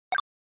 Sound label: GB Action Stomp